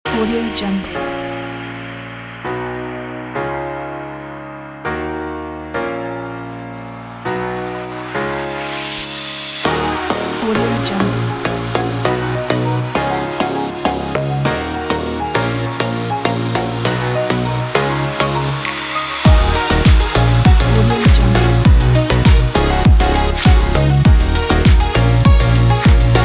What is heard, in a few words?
pop dance positive